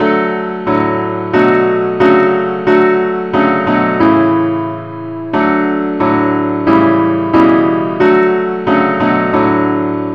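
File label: advance accompaniment key D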